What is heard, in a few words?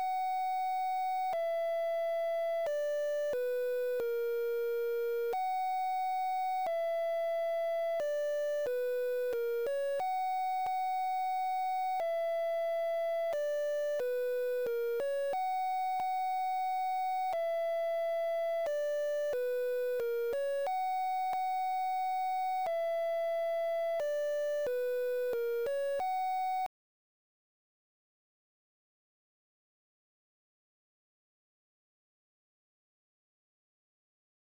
Harmony